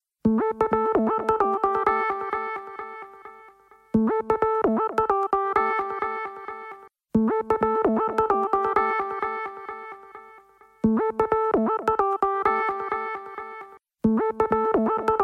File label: nice ringtone